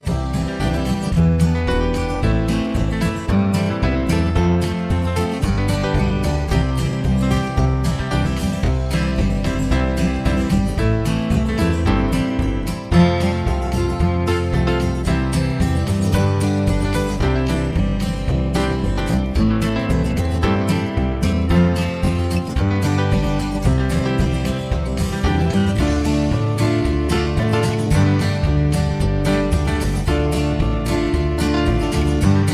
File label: Low Key